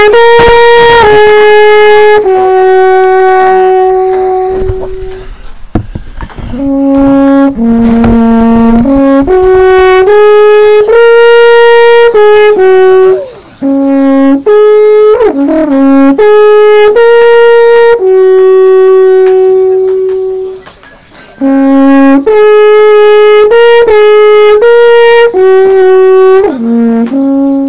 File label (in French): COR DES ALPES